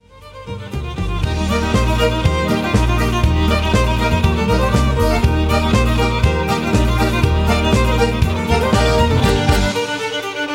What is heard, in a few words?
Irish Fiddle (instrumental)